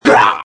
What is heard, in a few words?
hit; f-shaman